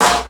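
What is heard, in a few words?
snare drum, Drum & Bass, 160 BPM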